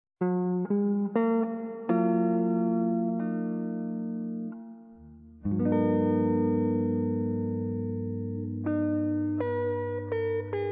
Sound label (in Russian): gitara; Razmyshlenie